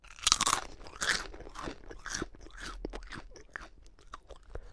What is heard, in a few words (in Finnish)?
rouskuttaminen